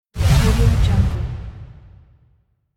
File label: whoosh impact